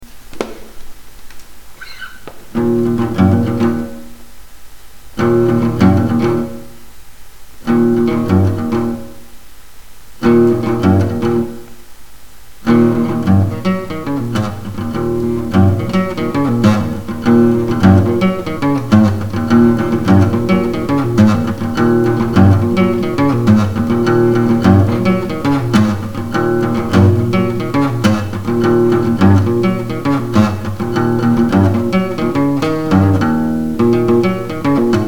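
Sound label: acoustic blues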